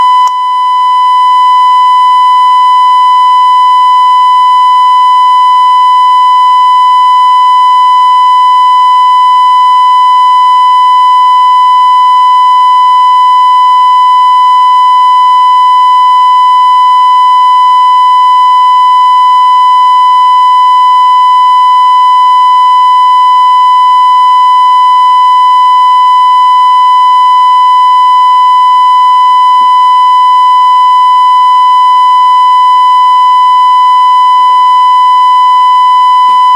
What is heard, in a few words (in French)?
Emmetteur 1000Hz; effet-doppler; exoplanetes et smartphones